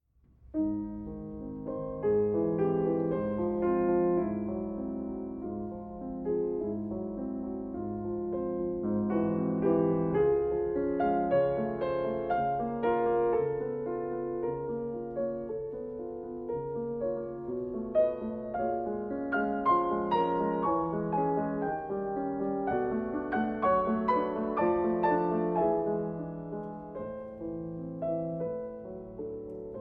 Prelude in A-Flat Major; Anastasia Calmus; Five Preludes and Fugue I.

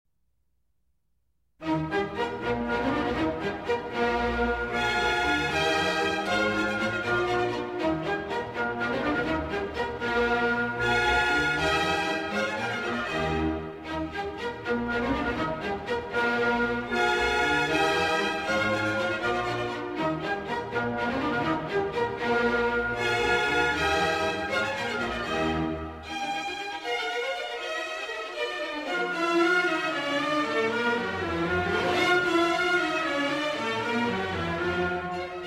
Symphony No. 1 in E Flat Major; Mozart Symphony No. 1 in E Flat Major, K. 16 - III. Prest

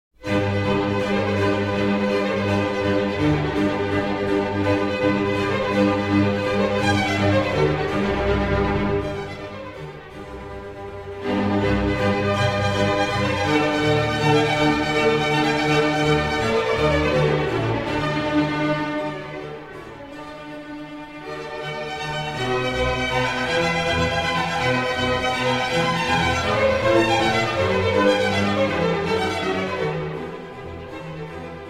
Concerto For Strings And Continuo In G

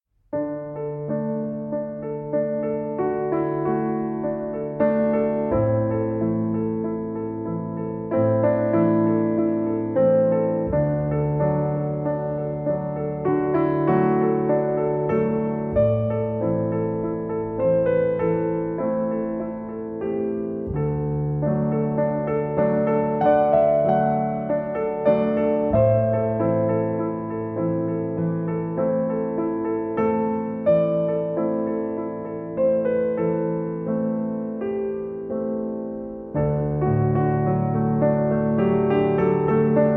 Piano Suite

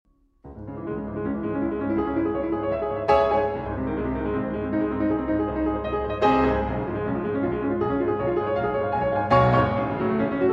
Piano Sonata in C-Sharp Minor, Presto agitato; Piano Sonata No. 14; Op. 27 No. 2 Moonlight

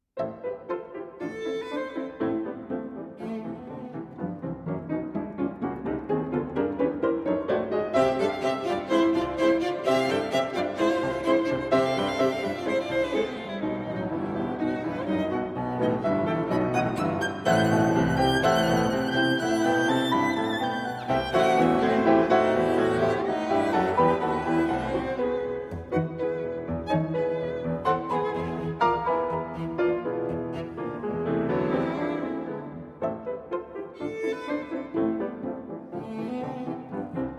Piano Trio, Scherzo. Allegro

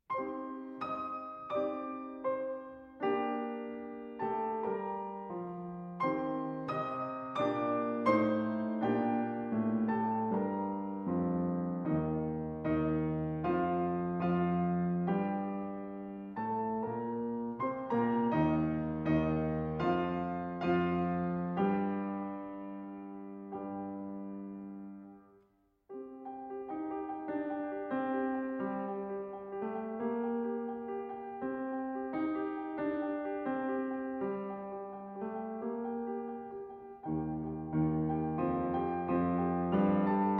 Russian Folk Songs; Tchaikovsky 50 Russian Folk Songs, TH 176 - 6. Keep on Spinning

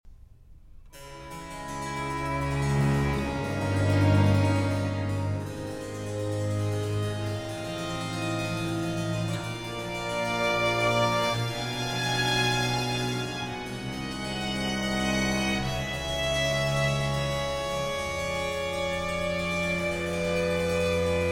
Violin Concertos, Concerto No. 8 in D minor

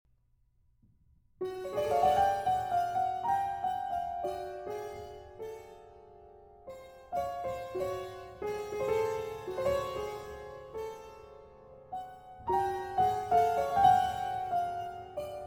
Music For Piano

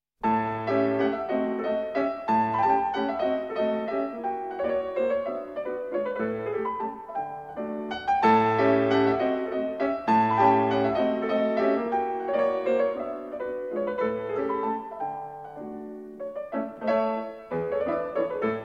Mazurka in D - Allegro non troppo

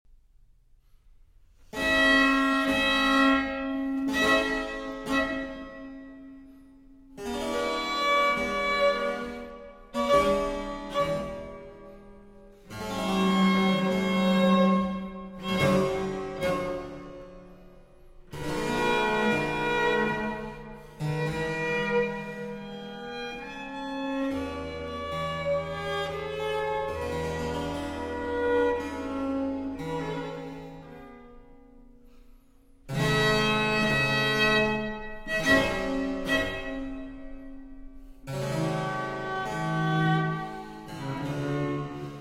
Trio Sonata in D Minor, Largo; Op. 4, No. 1 III.

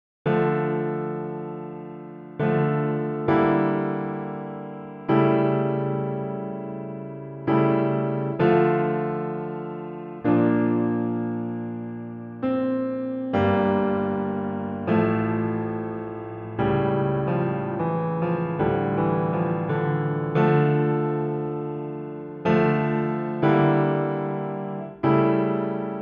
Violin Sonata Chaconne; Arr. By Ferruccio Busoni

